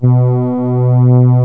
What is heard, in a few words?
CZ-PAD1, Casio CZ